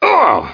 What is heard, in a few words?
SW PAIN; sw demo